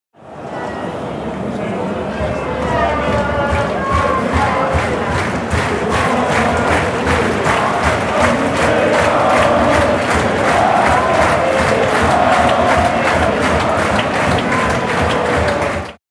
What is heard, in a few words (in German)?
Stadion Fans und Gesaenge